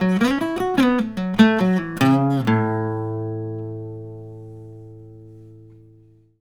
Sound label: Blues Guitar Samples, licks; ILIO; Fretworks